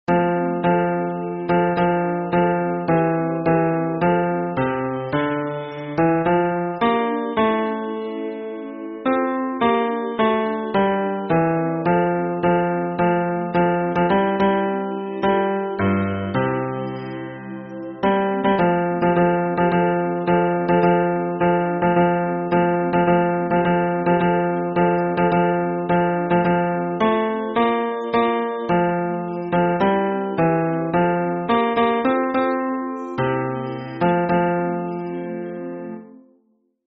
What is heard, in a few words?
hymn bass